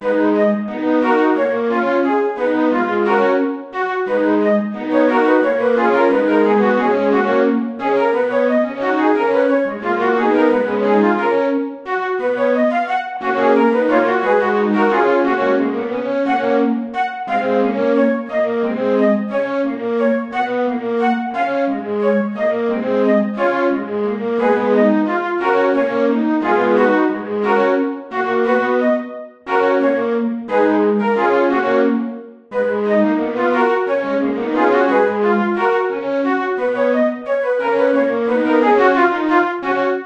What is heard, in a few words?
Flute